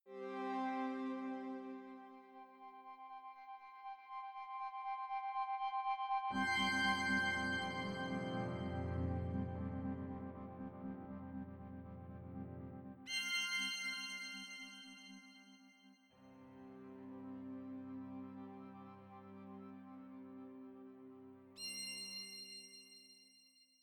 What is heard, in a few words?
Chimes